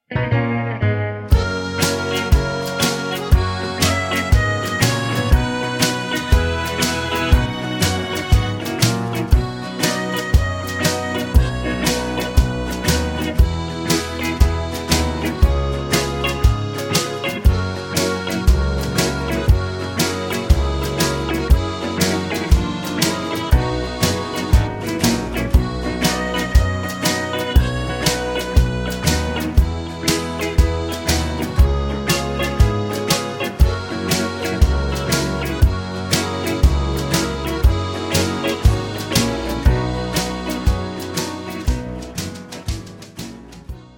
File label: B No Backing Vocals, backing tracks